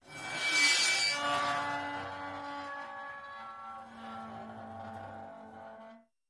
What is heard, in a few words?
Metal Creaks Slow Scr, scrapes